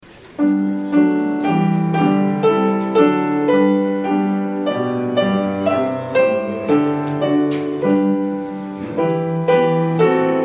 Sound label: Hymn; God, whose farm is all creation